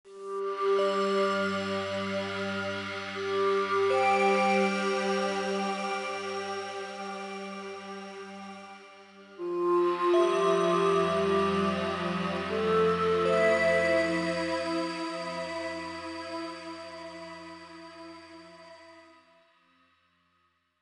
Background Music

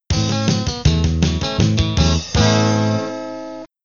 Bonus Miss; Turkey Hunting USA; arcade

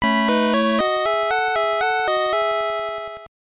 Jingle; Monster Maker 2 Uru no Hiten; gb

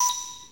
Tutorial Popup

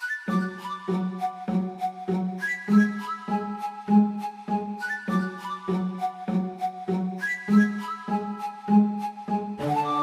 Forest Event

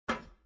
metal; tempala

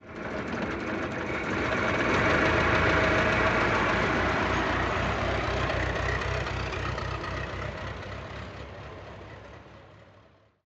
Car Ford T Idle and Depart; the 39 steps

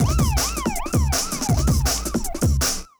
Police Car Theme; Pinball; Pro Pinball Trilogy; 2001 dreamcast gamerip

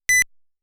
Credit Sound

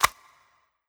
ui inv open

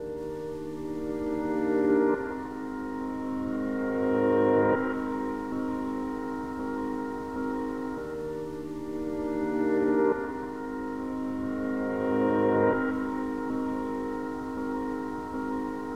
Reversed Piano; Proteus

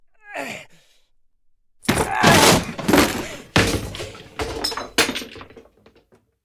Foley straining to open cupboard; the 39 steps

